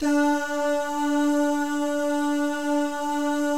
DAH, Vocal Jazz FX; Spectrasonics; Vocal Planet; JAZZCOMBO

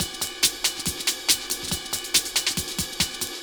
Beat, Drums, Eclectic Electronica

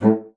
bassoon A2; Xsample VOL-6; C BASSOON

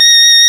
REED A#; Big Fish Audio; Synclav FM

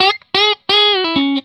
RIFF 120, Vintage Blues Guitar

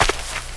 DIRT, footsteps; AKAI S-Series CD-ROM Sound Library VOL-6

